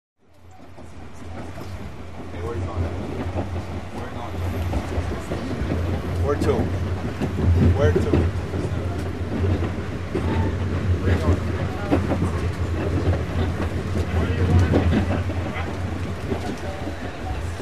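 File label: Amtrak Train Interior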